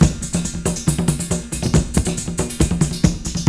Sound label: AFRORHYTH0CR; Northstar; The Wizard VOL-1; AFROSIS